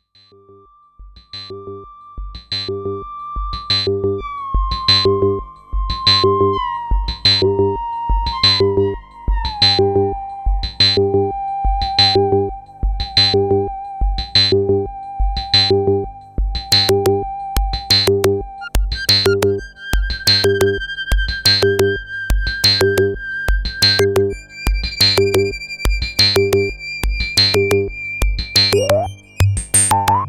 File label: pro1, synthesizers